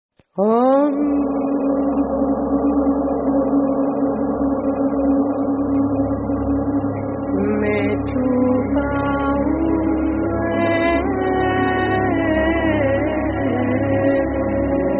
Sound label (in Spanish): mantra liberador